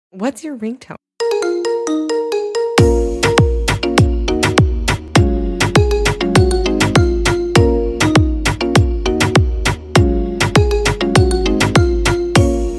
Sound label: ringtone marimba remix